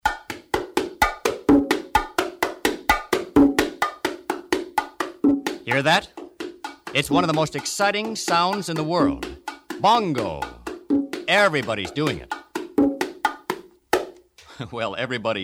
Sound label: bongo